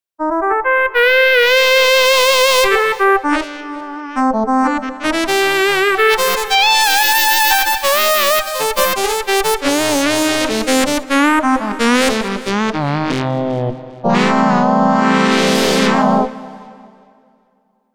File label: FM Saxophone; Montage